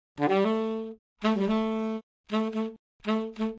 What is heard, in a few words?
alto